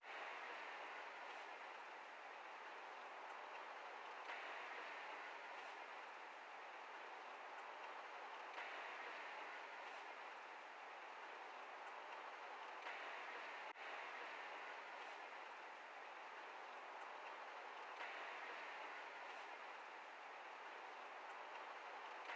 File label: 140 BPM F Min Texture; Melody; Trap; Loop Stems; Trap Pt 2; Plug Talk